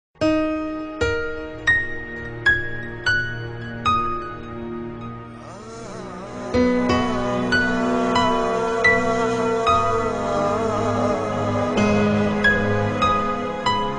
soft soothing piano

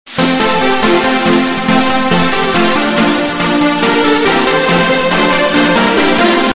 jingle bells techno sms

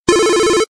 ringer; bbpro

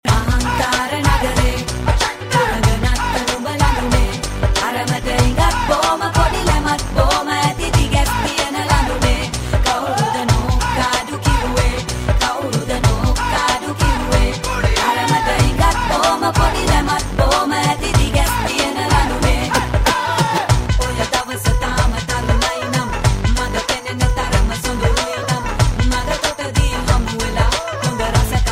asian flava

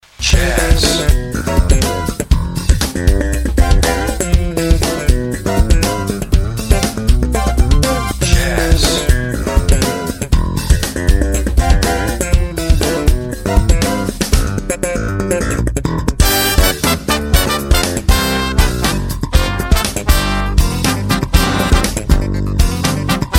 funk jazz

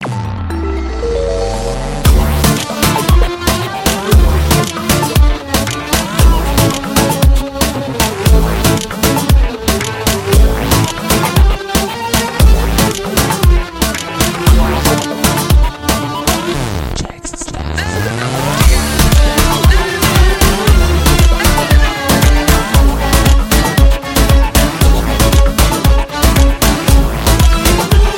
turkish summer ringtone

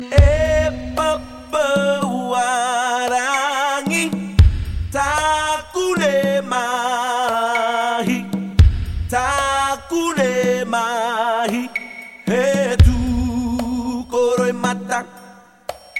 chant of the island; fiji

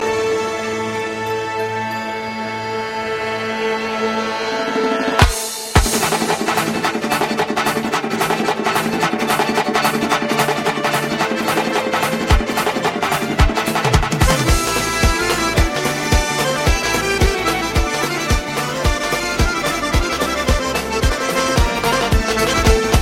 lezginka; dagestan

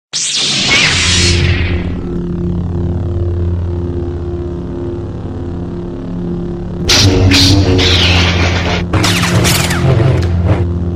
lightsaber

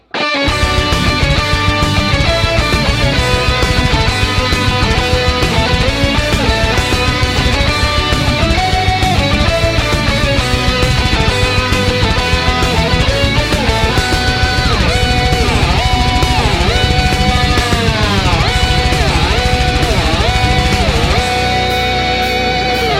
metal; skyrim

